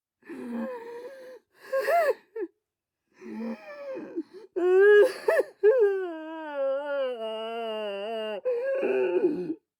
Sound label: male dramatic crying